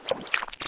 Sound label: slosh